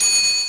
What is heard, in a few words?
ding